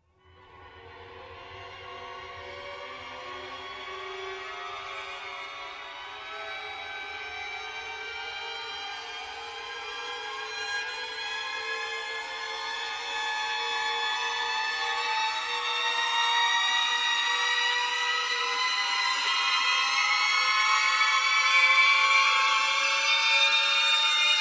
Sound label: horror increasing violin suspense